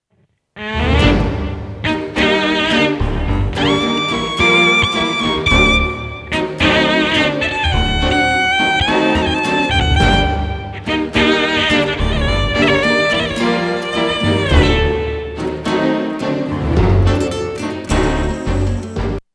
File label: Tango